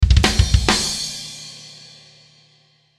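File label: Musical Element, Drum Snare March; Blastwave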